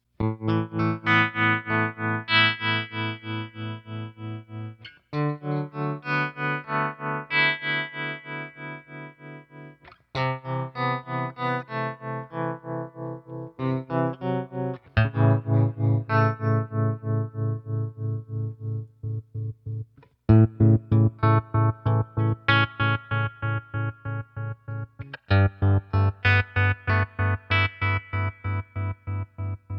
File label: Telecaster through 6V6 Princeton More agressive Tremolo sounds playing with wave form; Terrain Deluxe